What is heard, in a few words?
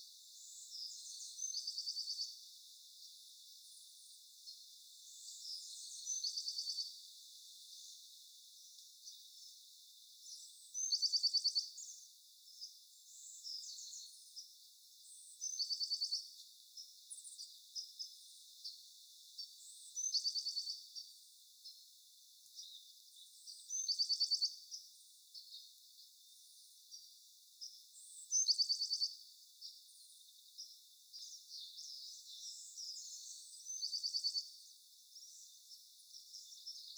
exterior, ambient; nuke